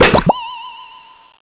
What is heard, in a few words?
boss duck gets hit; Bounce Touch